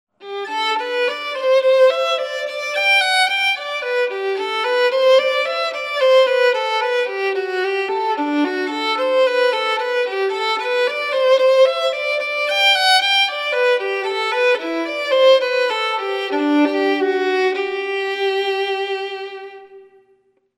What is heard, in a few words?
Solo Violin; Wedding Ceremony; Ceremony Musicians